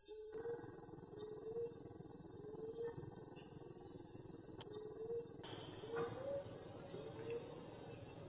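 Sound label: Barred Owl female enticement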